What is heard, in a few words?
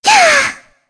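Attack, Korean; voices; heroes; Shea; kings raid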